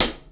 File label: sparkly hat one shot c key; hat samples